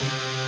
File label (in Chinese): 电吉他 C5; th13